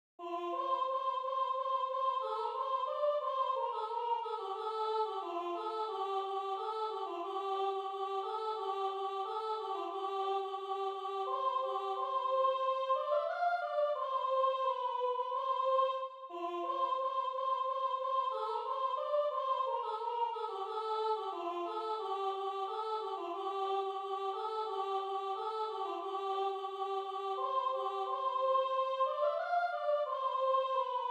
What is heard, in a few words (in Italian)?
I cieli immensi Soprano; Base soprano; I cieli immensi (Benedetto Marcello)